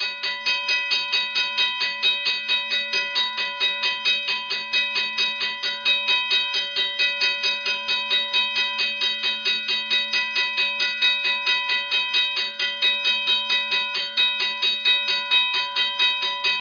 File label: fast grade crossing bell